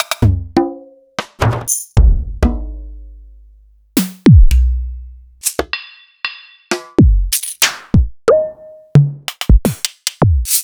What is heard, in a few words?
EMU Orbit